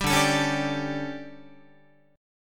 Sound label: D Major 7th Suspended 4th, Guitar; DM7sus4 strummed